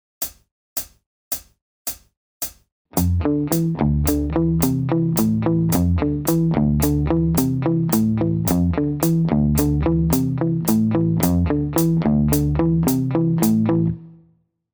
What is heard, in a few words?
riff